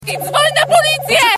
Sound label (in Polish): Dzwo324 Na Policje